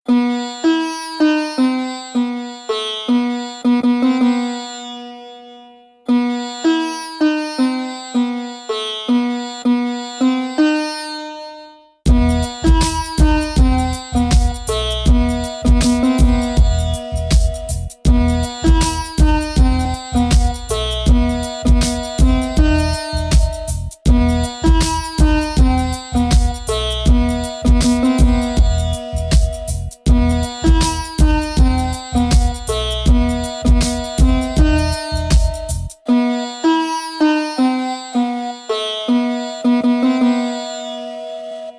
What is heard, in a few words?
sensual rhythm 432 hz; snake arms; Ramiona węża